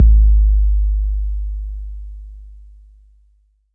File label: BD 808 Tape Decay